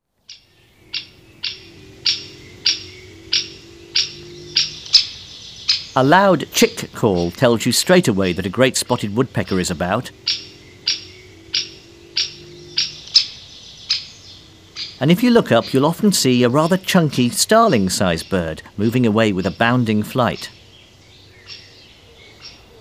Woodpecker greater spotted; Pic (vert); bbc Bird Song Site

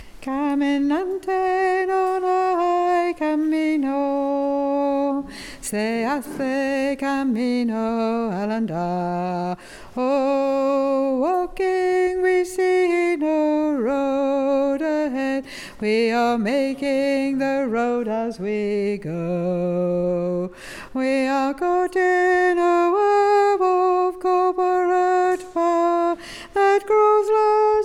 alto

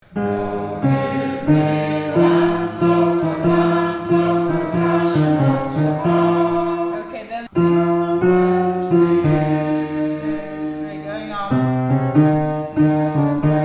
Tenor

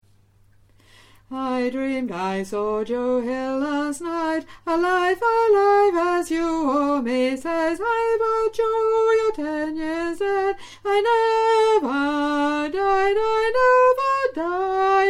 Bass

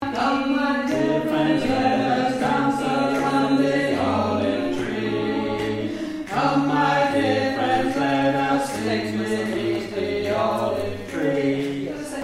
Bass Chorus

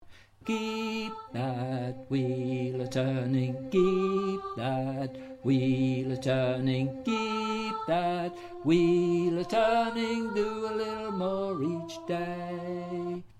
Chorus Voice Alto

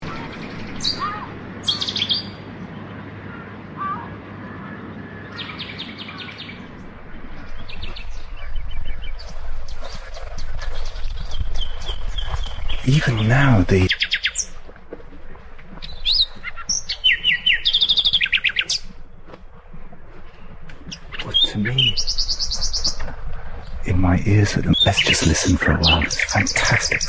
nightingale

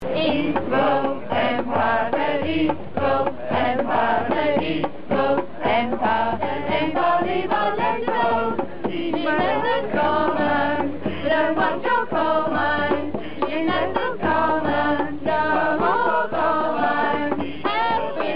Round

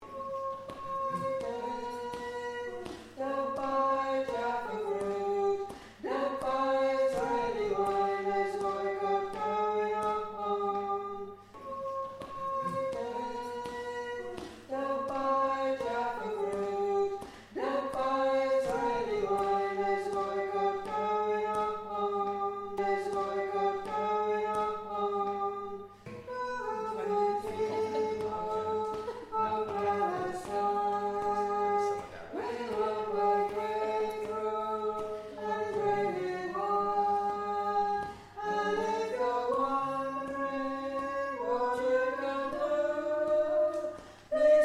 tenor, singers

